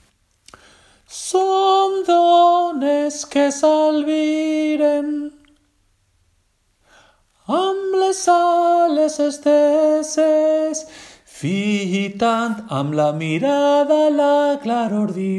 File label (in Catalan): Cançó de mar SOPRANOS